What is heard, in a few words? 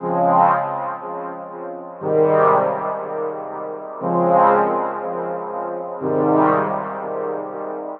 Dub Chord Loop Ab7, Dub Techno; Loops; Ghosthack; Ghosthack - AC2023 - Day 17 - Dub Techno & Deep House Chords